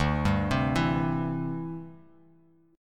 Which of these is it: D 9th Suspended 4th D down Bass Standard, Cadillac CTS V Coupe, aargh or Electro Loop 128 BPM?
D 9th Suspended 4th D down Bass Standard